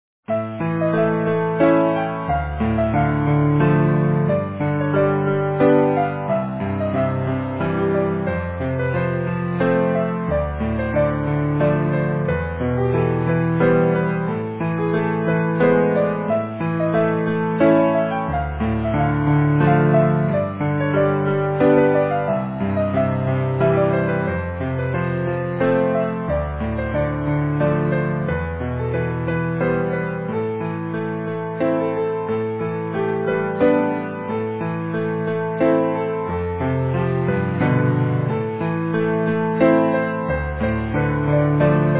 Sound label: Easy Piano